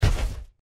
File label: run, power armor; clothing system